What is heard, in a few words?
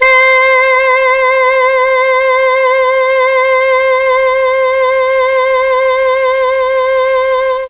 C3 alto sax, mellotron; CHMB ALTOSAX